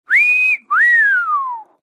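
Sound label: cartoon whistle sounds at the sight of a beauty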